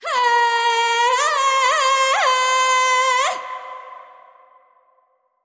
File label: Vocal Fury Queen Rally Cry